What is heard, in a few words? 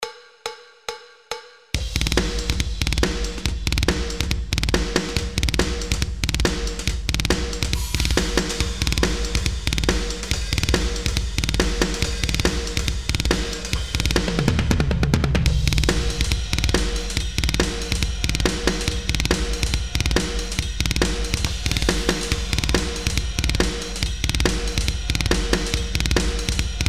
iceberg drums broken; taldrum